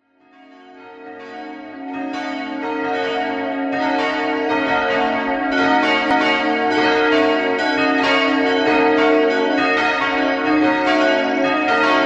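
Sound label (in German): Tonaufnahme ais1 cis2 dis2 fis2, mehrstimmige Geläute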